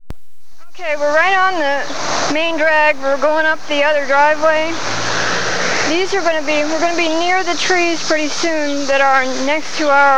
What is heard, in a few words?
cicadas